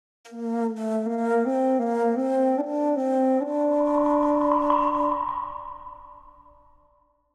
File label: Video game success victory win jungle